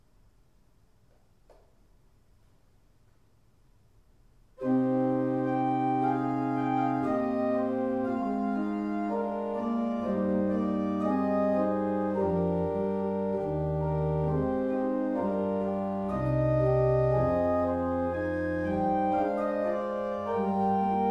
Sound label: DURUFLÉ CHANT DONNÉ, Organ; DURUFLE, M.